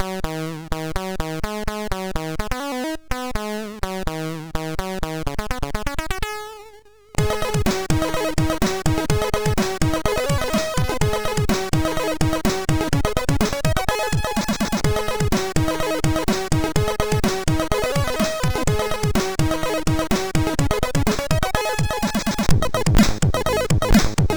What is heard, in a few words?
Ding Dong, sid MOS6581R2